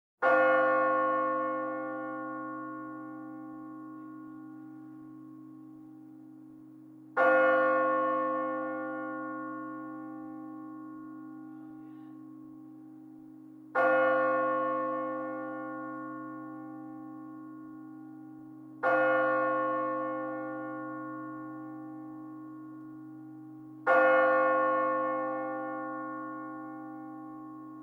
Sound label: Bell Tower Medium pitched Bell Tolls Five Times Slow